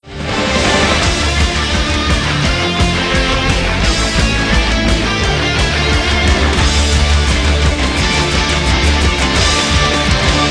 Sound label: Battle Music; Zak Storm Super Pirate; mobile; Battle Zite Music